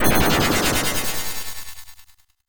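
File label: synth, Production Effects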